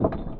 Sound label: Panel Safe Open Spin Start; Among Us